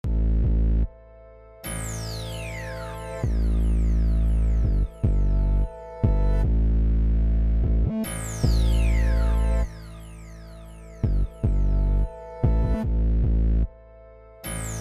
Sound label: Bass in Space, Musical Beats; smirksmilegrin games